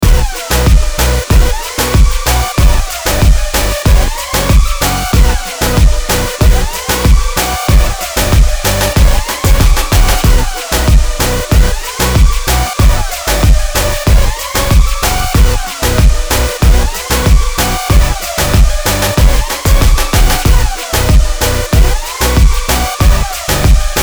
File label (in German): besessenen trommeln